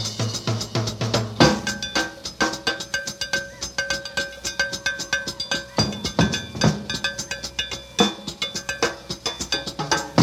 Drums Solo

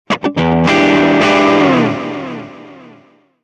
Classic Rock; elements

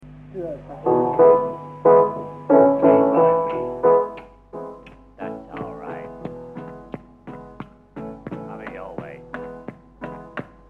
Improvisation

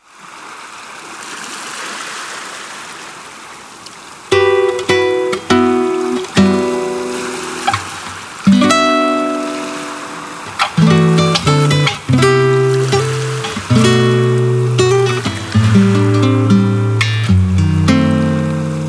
Bossa Interlude, Ska

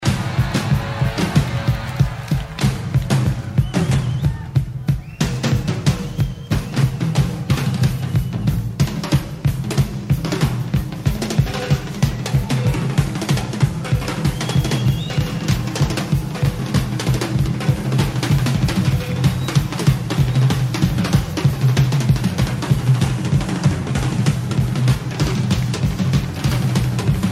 Drum Duet (live)

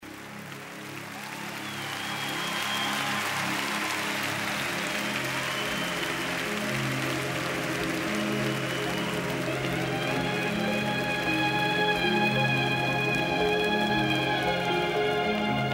Slow Marimbas live

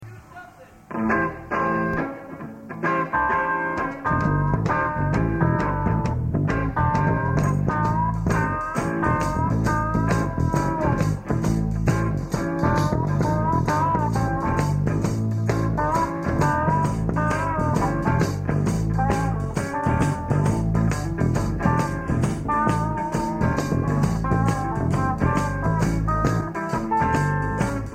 Instrumental

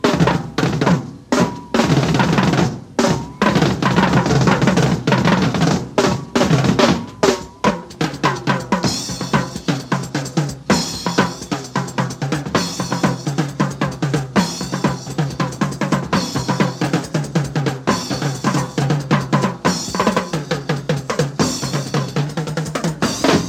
Drums Solo

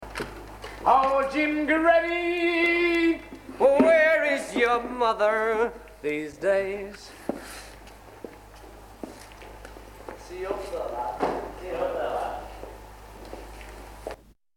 Improvisation

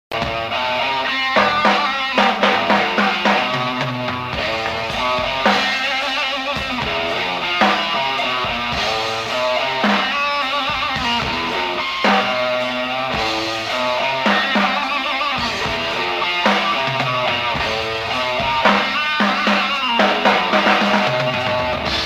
Unreleased Jam

